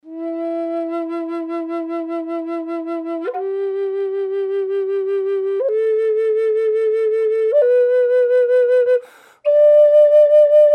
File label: sapele em dry; flutes